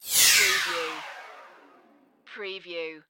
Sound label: Laser Hit Slow